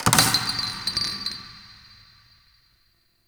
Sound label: Subway Garbage Amb Misc; Nineteen Ninety Nine